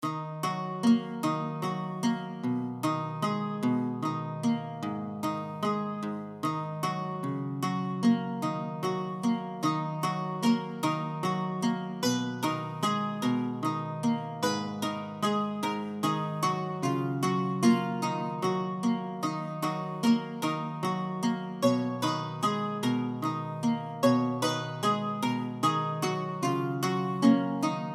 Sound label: Solo Lute Version